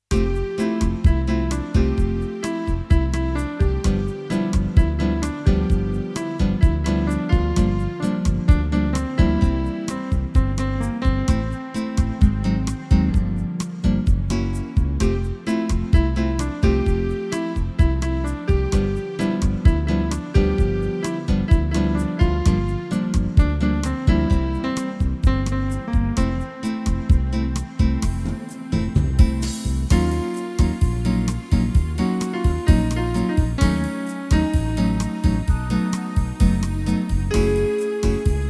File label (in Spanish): organo